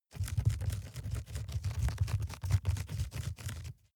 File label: rat run trample fast